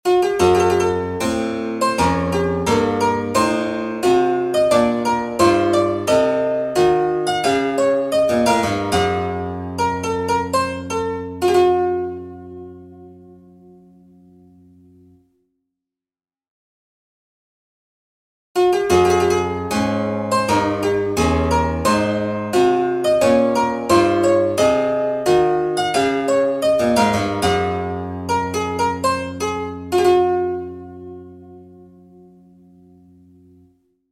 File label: Baroque Exercises 31edo; Oneiro